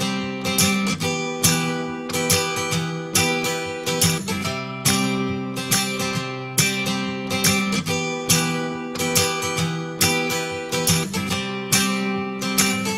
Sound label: Iphone 11 Update Tone